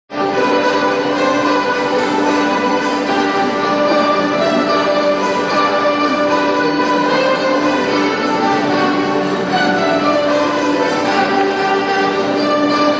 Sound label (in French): Valse Epinette Light